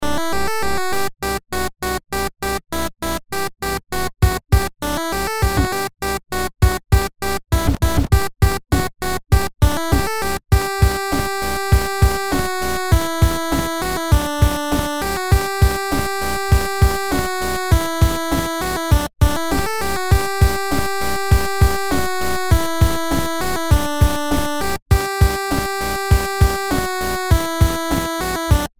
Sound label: Ringtone 8bit; TankiOnline